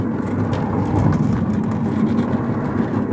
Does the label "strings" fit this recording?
No